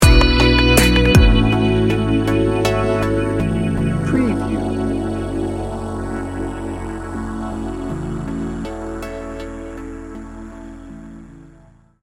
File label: dreamy addiction sting bumper